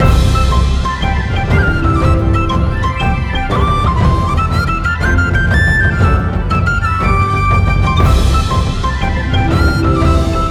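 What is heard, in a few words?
free spins golden loop; netent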